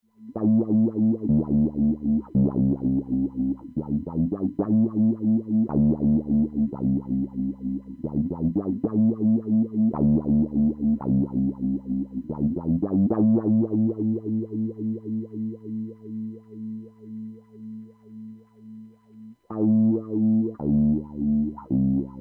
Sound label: wahoo auto wha; Sonuus